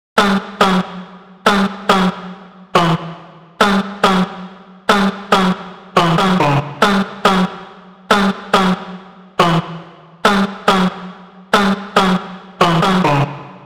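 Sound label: vocal chop, 140 bpm, F#m; HardPaint; Slate Digital Omnivox Vocal Sample Pack; Chops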